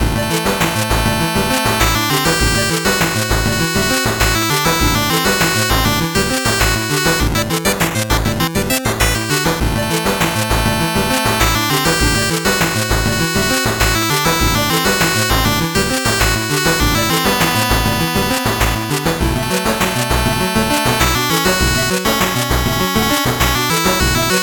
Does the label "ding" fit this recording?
No